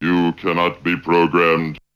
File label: Vocal; ZERO G - Datafile DISC 2